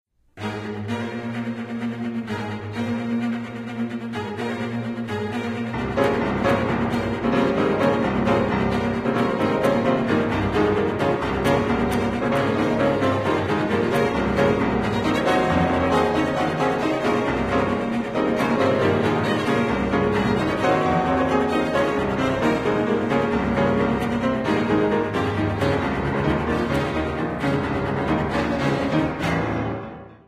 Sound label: Piano Quintet; Ornstein